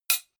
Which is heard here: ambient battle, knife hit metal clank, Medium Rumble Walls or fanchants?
knife hit metal clank